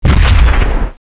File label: carpet bomb; gearbox; manta